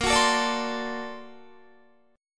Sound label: Bb Augmented Major 9th Bb down Guitar; 4E,4A,5D,5G,5C,6F; Bb+M9 strummed; JGuitar